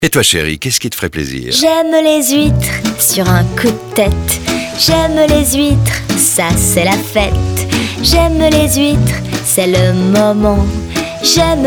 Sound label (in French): Voix chantée complice et rieuse